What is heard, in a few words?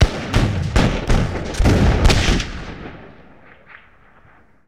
Musket Scattered Shots, Period Combat; Sony Pictures Sound Effects Series